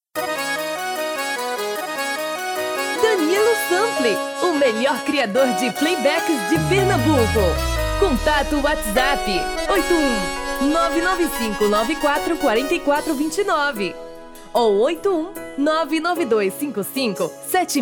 tom feminino

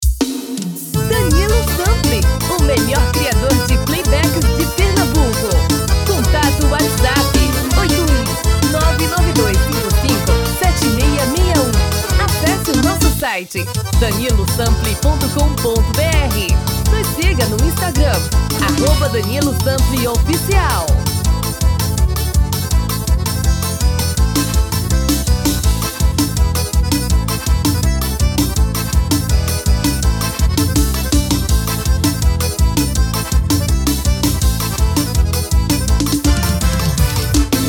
tom masculino

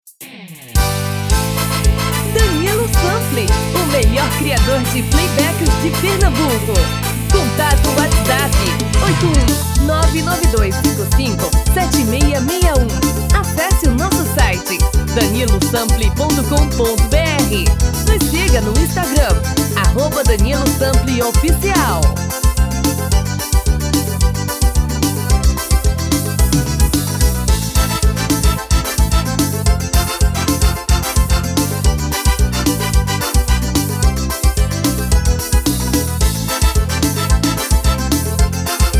POT POURRI forro das antigas tom feminino

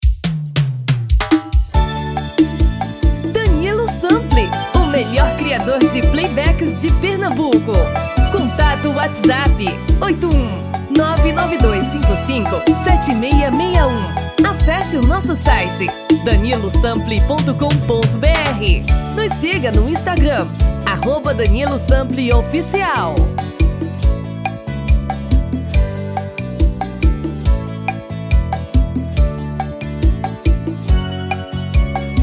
Arrocha feminino

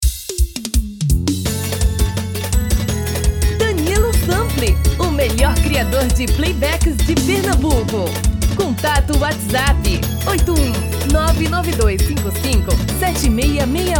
Eletrico

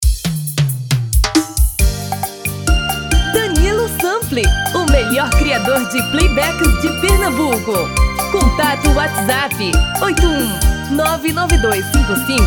Feminino

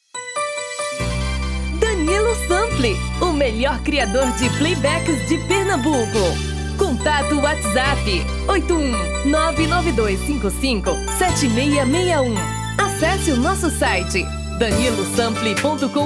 Brega

